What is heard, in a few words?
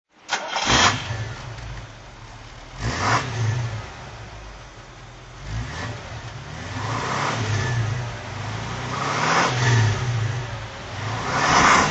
bentley t1 throttle